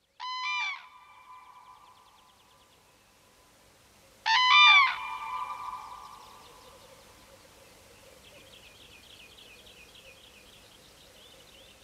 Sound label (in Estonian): sookurg